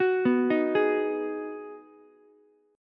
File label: Ab Minor 7th down Ukulele; arpeggiated; JGuitar